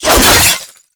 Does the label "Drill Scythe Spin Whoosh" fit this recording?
Yes